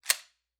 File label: Part Assembly; TOOLKIT vol.2